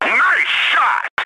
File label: hit